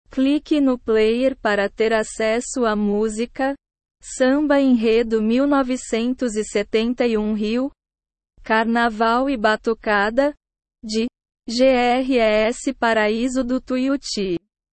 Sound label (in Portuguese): samba enredo carnaval e batucada